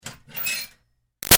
Ironing Board Metal Move Screech; Miscellaneous Household Sound Effects; Big Room Sound

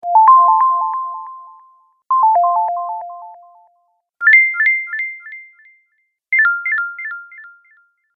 Approved App Sounds, beep

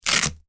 velcro, Velcro Rip; Velcro Rip / Pull; Ripping (Sound Effects:Objects); McKinney Sound

